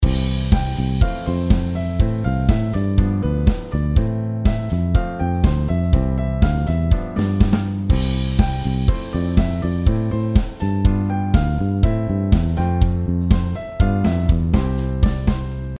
メロ ＋ ベース

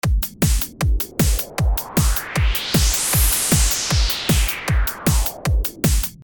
ノイズスイープ

